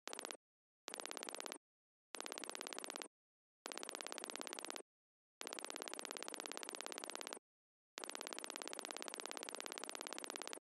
Typing Similar Aliens

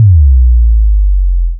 Bass drop short

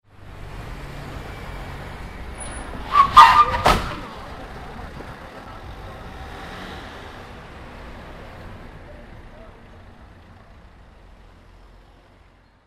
Car Crash

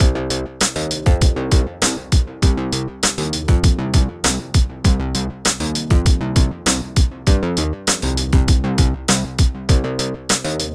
Retro Action Groove

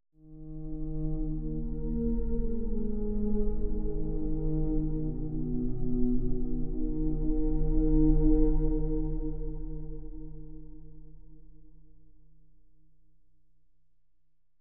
Choral Strings

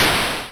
Explosion Sound

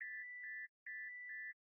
Alarm Copter panic

